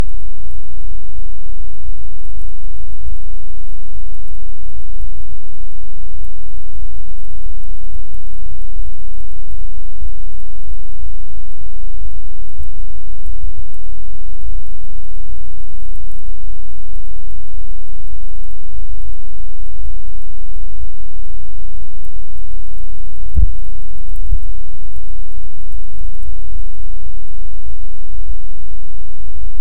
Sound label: Hydrophone; OneOcean